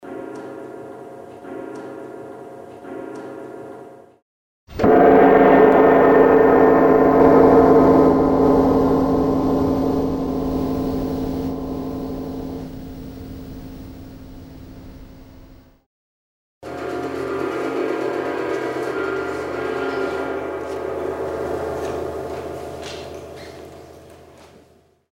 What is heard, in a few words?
Gong